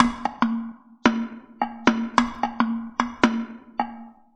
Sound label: Acoustic Percussion